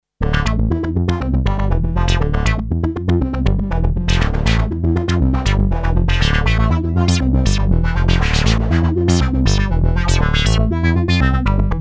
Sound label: matrixbrute2 hectic